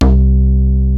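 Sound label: ETHEREAL bass, Peavey DPM; Rubber Chicken Software Sample Bank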